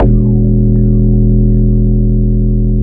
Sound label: ufo bass